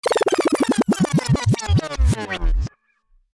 Classic vinyl rewind

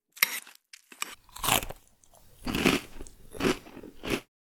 Breakfast cereal eating with a spoon

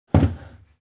Setting down a chair on the floor

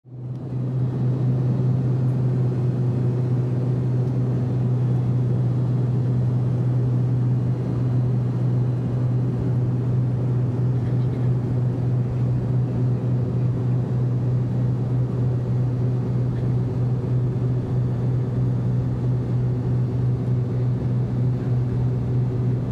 Airplane ambience inside preparing to take off

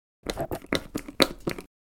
Sauce pump dispenser press

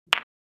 Billiards pool shot

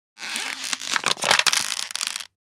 Plastic materials movement

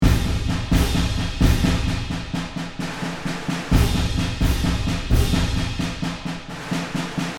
Field drum marching loop

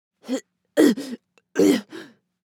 Young woman retching

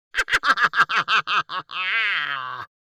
Witch fantasy vocalization laughing cackle evil

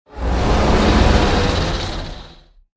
Werewolf rage roar attack

